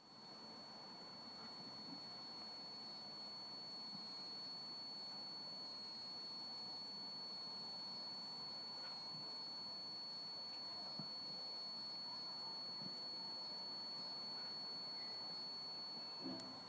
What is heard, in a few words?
coil squealing